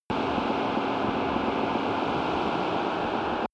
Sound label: busy machine room